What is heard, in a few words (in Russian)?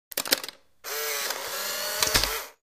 zvuk polaroida